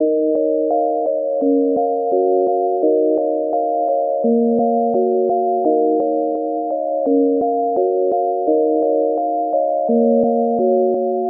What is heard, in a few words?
Nostalgic Melody Loop - 170 BPM D# Min Bells; Melodies; Loop Stems; Cymatics; Oracle Sample Pack